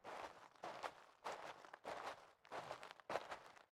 Footsteps Desert; BLIND QUEST - The Enchanted Castle

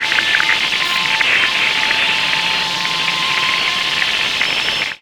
Static; Blastwave

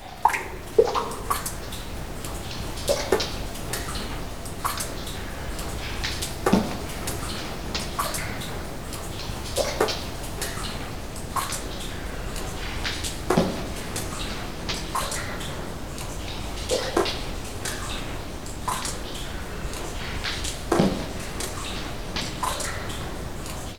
waterdrops; best service Blue Box; water fx

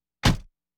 Automobiles various

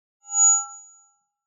Microphone Feedback - Short Classic; Hollywood Edge; sound designer's toolkit v2

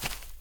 long grass; firefight

